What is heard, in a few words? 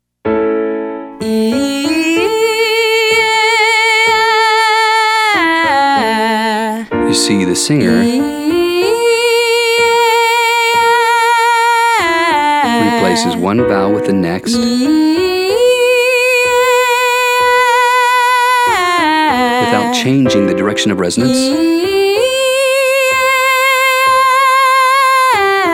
Eat to At female